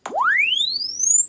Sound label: Press Two Sides Chirp; Samsung 4 Recordings